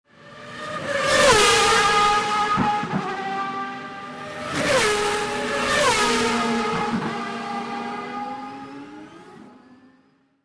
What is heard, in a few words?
F1